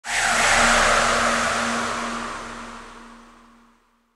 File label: Alien Com